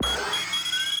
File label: chopper charge; teardown ps5 windows xbox series xs gamerip 2022